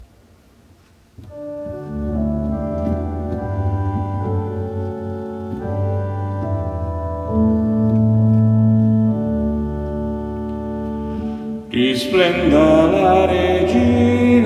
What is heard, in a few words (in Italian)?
Salmo Alti; 15 Agosto; assunta